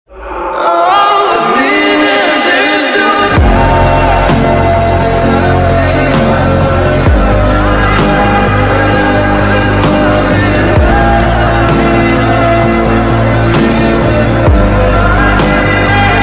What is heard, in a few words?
slowed loop